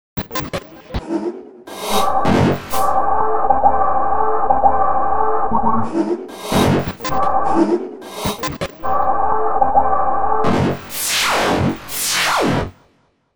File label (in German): Singende Maschinen